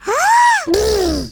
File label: China Miss Sound; Jolly Battle; Android